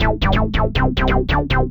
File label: SNTH BASS ELCTR 140 A, Techno